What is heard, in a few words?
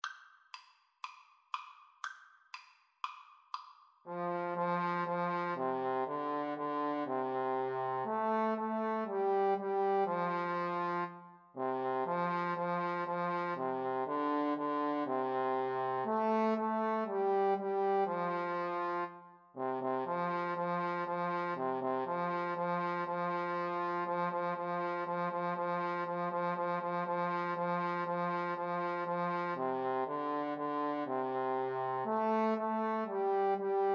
old macdonald, Trombone Duet